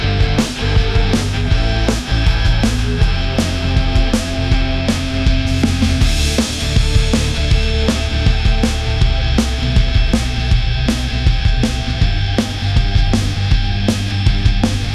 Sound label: energetic rock